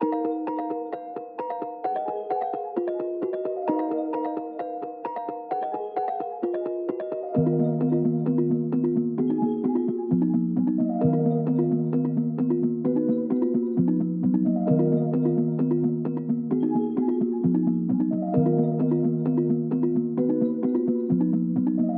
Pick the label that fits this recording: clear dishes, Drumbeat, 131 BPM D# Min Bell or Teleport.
131 BPM D# Min Bell